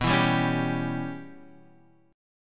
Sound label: C sharp Minor 7th Flat 5th B